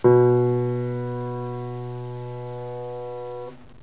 piano h

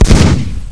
barrel, weapons; unreal; quad

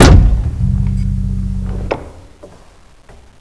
button

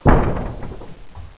button

